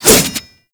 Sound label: Light Glaive Whoosh; Warframe